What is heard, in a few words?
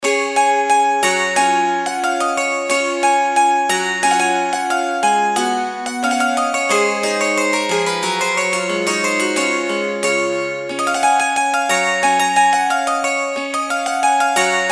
autoharp; dowland puffe